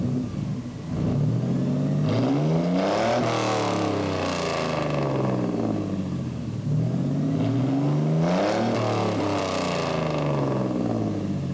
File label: revving; All sounds were taken from a 8mm video camera, and lined-in to the computer